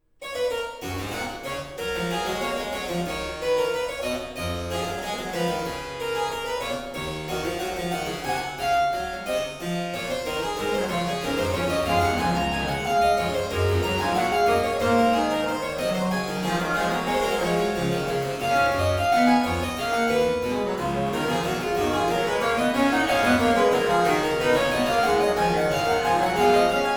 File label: Sonata in G Dur Allegro Moderato; Bach; BWV 1027